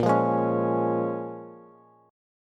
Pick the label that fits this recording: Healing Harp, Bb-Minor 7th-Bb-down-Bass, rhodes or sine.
Bb-Minor 7th-Bb-down-Bass